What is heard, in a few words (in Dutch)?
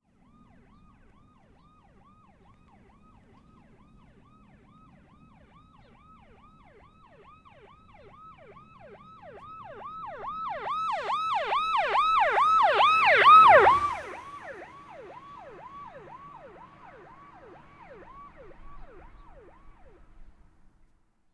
Sirenes Van Politie Ambulance; KMP Film Video Sound Effects